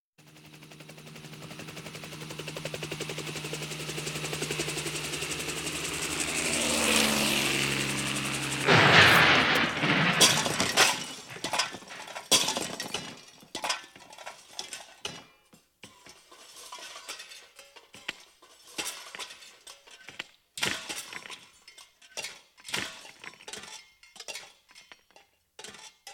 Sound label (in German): zwei hubschrauber stoßen zusammen; Katastrophen